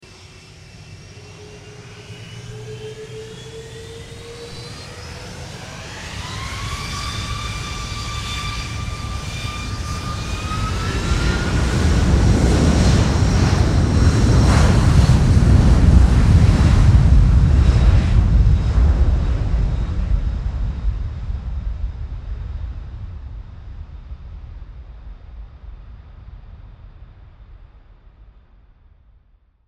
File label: aircraft boeing 747-400 jumbo takeoff